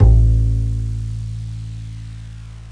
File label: Upright Bass A 2; Metro 4.5; MetroSounds